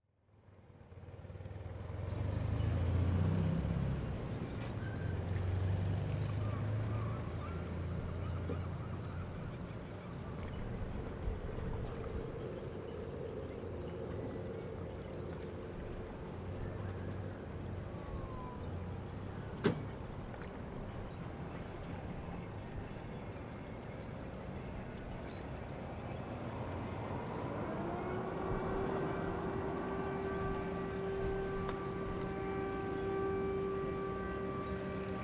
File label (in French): port brest; carte postale